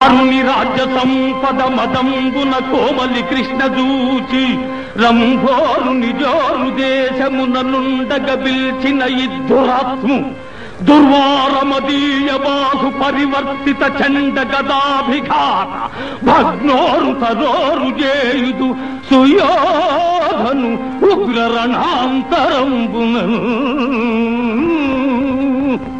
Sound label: telugu song